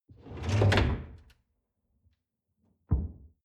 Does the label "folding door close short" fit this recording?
Yes